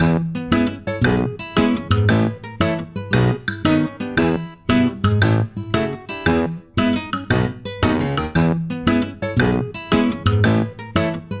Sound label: Post-Question Loop; Jackbox Games; The Jackbox Party Pack 1; YDKJ